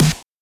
treble heavy acoustic snare sample g sharp key; snare drum samples

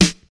sizzly snare drum sound F sharp key

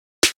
trap snare, Trap Snare Drum One Shot; drum single hits one shots; snare drum samples

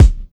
rich lows kick single hit a key; kick drum samples

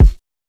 old school warm kick one shot a sharp key

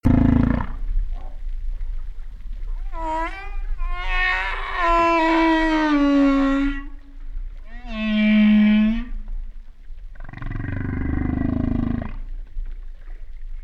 humpback whale sound; soundscapes

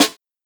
airy snare single hit g sharp key

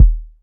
rich low end kick sample d sharp key; kick drum

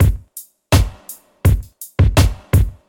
83 bpm reggae drum loop sample g key

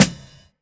subtle reverb snare drum g key

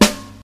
old school good steel snare drum g sharp key

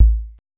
00s small reverb kickdrum a key; Duration 0:01; kick drum samples

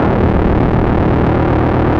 monodelay d sharp 129, synth; synth loops

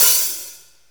long tail reverb open hat sample f key

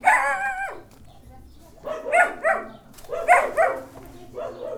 small dog bark

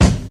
shiny steel kick drum c sharp key; Mono; kick drum samples

